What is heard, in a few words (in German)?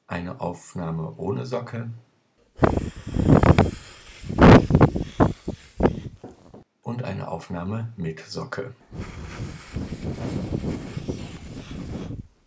Socke als Windschutz